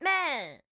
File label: deer exclaim